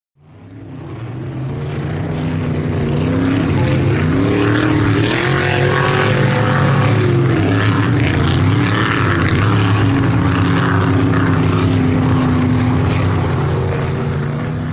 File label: Power Boats By Fast C, off shore power boat